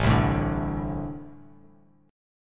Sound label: A-Minor Double Flat 5th A Bass Standard; strummed; JGuitar